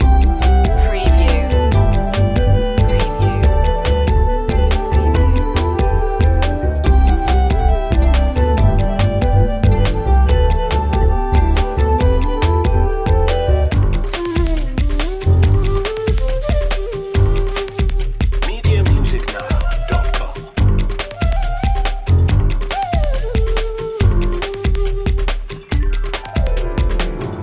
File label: india flower festival loop